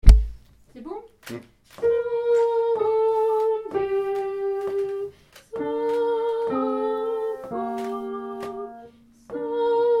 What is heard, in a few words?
Trisagion Soprano